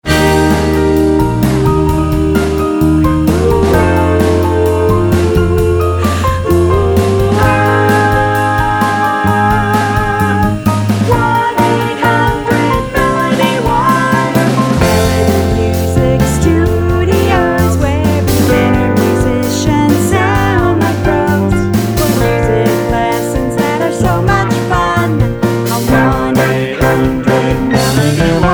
Jingle

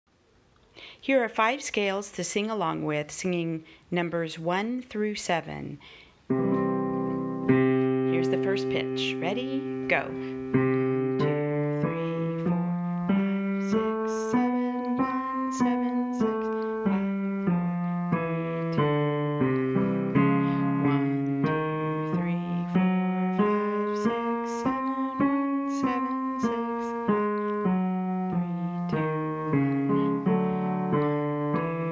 scales

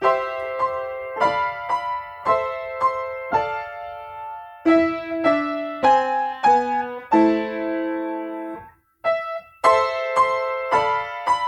Piano

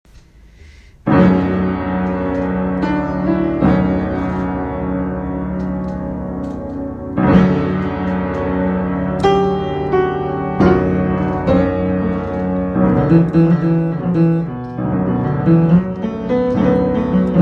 Piano

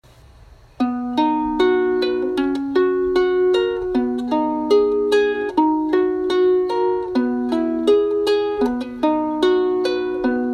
Ukulele

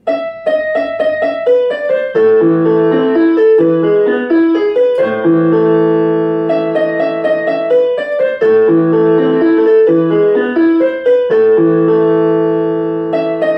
Piano Fur Elise

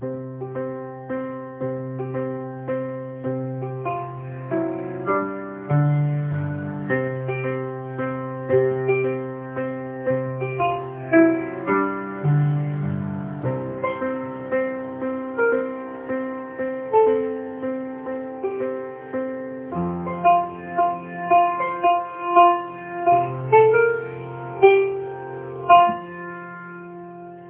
Piano

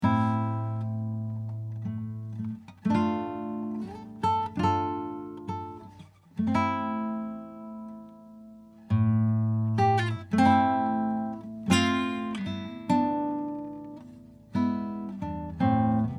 Guitar

